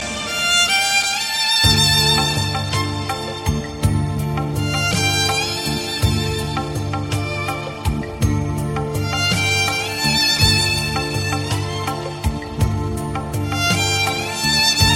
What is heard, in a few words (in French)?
Violon